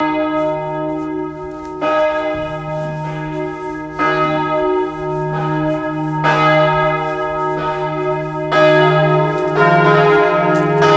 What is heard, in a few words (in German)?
Glocken Dortelweil; Bad Vilbel